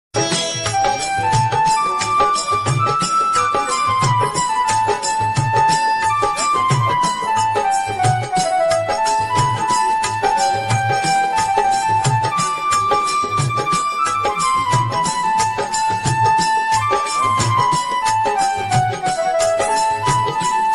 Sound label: piano instrumental, piano cover; jay hanuman gyan gun sagar